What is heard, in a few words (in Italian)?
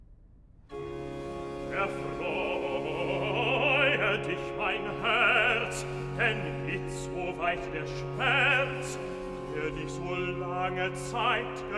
Recitativo (Bass); Cantata, BWV 151 'Süßer Trost, mein Jesus kömmt'; Bach; New York; 3rd Day of Xmas